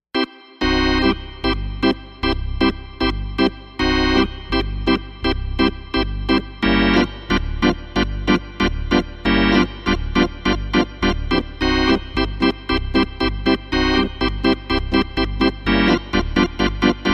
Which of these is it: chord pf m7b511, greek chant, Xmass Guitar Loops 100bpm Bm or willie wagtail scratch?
greek chant